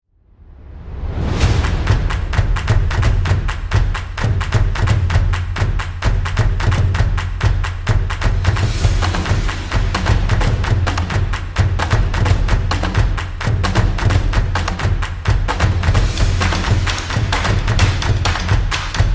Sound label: Percussion Background Music For Videos